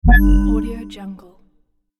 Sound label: holo accepted